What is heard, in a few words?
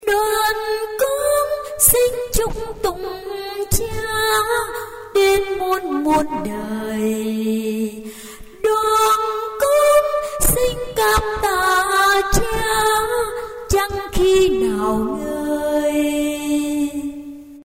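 soprano alto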